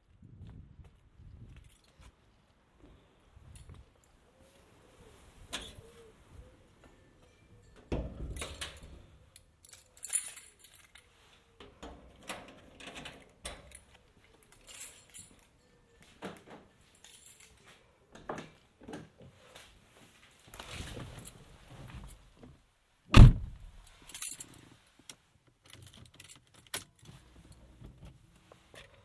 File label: soundscape binaural trip to the shops